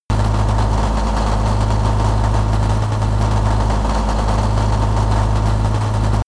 truck unsteady damaged

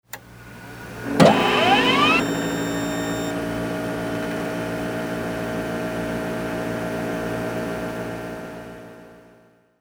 printer on

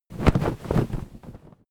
flag medium flap

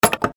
machine burst

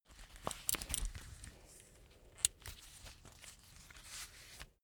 leather belt close soft

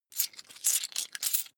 coins big clatter fast

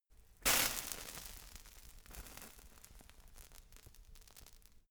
fire torch sizzle hard

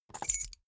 key ring small grab table hard

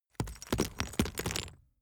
stone drop small stones

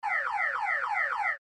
car alarm

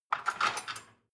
dishwasher cutlery basket rattle

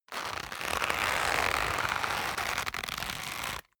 leather creak long medium

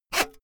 saw use short fast

